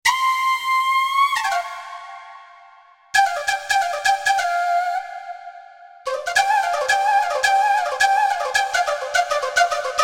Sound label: vc 1 shakuhachi